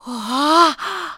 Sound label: Gasp; Ravio (unmasked) gasping as the Triforce is restored; zelda